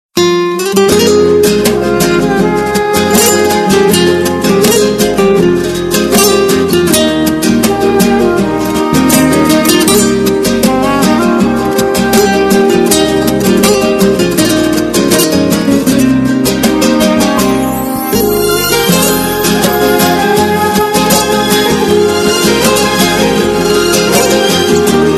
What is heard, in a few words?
Amazing Guitar